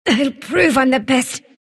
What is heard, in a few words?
sylph move pain; dota; dark willow